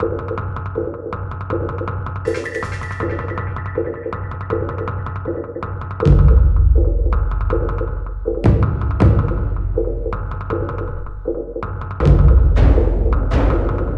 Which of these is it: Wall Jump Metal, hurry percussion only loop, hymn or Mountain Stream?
hurry percussion only loop